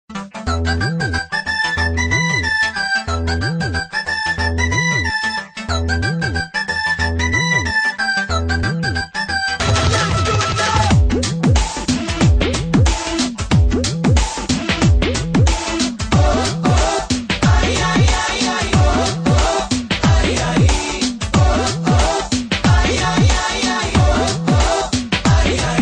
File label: BGM Ringtone; Jalsa